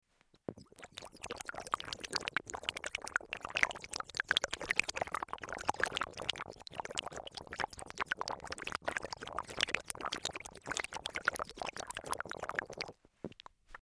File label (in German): gurgeln